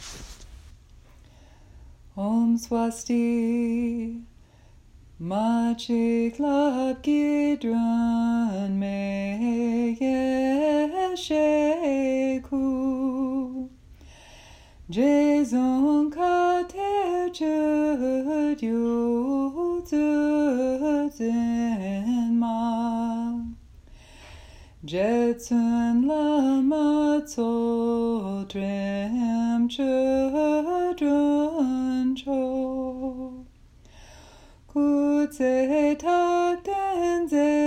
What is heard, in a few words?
Long Life Prayer alternate melody; Long Life Prayer for Lama Tsultrim from Adzom Paylo Rinpoche; Lama Tsultrim’s Long Life Prayer Audio (Alternate Melody)